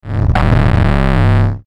Swell Static Multiple Hits; Blastwave

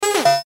Flash Animation Multimedia

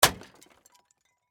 Impact Automobile Headlight, Smash, Shatter; Crash Sound Effects; Crashes; BlastWave

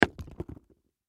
Shoe Boot Drop, Shoe Boot Drop Take Off; Shoes (Sound Effects:Objects); Foley; Blastwave

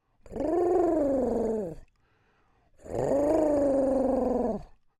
Creature Oodle Bird Calls Suspicious; Birds; Animals; Big Room Sound

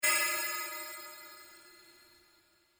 Created pulse; Various sweeps, pulses and drones; Rise And Dive; Rises and Dives; Sound Design

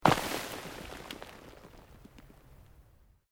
Earth Loose Move Short; Material components for constructing more complex sounds; Debris; Materials; SoundEffects Foundation2

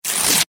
Office Index Card Rip Tear Cardboard; Ripping; Objects

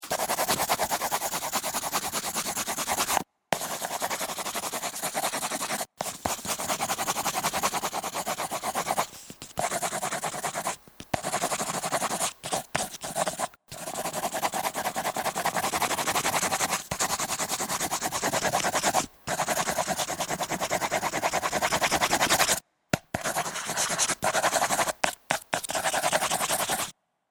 Sketching, Sketching on paper with a pencil.; Miscellaneous Office; Offices

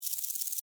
Insect Rattle Shake, Bug; Animal; Insect Movement Sound Effects

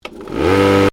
Lawnmower (Electric): Switched On; Lawn Mowers; Machines; Stuart Duffield

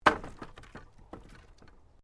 Wood Planks Fall

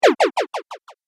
Game Laser Pulse, Video Game Sound Effects

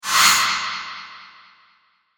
Horror Strike; Hit Sound Effects

